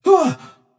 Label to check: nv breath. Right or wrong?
Right